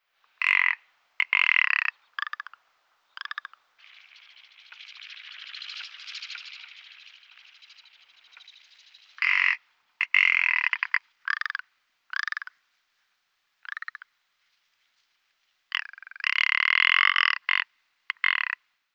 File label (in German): Alpenschneehuhn Lagopus mutus